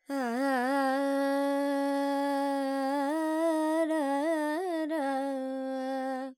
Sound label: Charukesi, Vocals, Carnatic Female, Alaps, G, Dry; Sample Banks